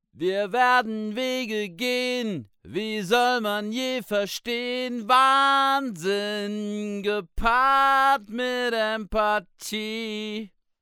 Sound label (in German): WA 47jr Vox Niere